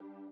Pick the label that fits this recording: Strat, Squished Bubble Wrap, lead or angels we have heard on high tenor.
lead